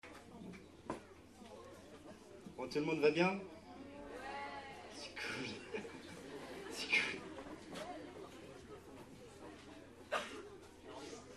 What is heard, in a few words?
interlude concert